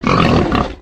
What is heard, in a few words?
boar idle